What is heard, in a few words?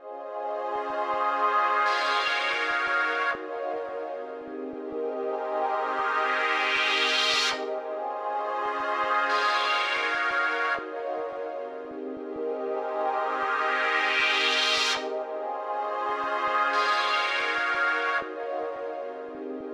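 filter strings; 129BPM; filtur strings; Pony Fan Music Remix Stems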